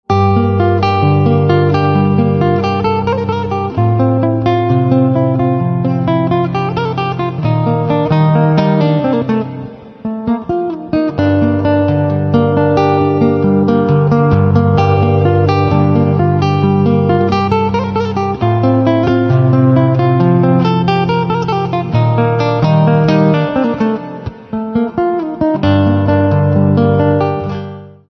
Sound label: Guitar; instrumental ringtones